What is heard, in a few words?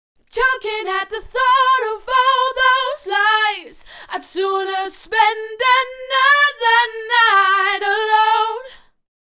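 dance vocals 120 double Am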